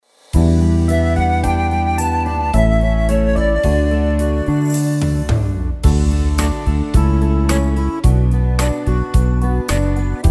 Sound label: 8Beat, tyros5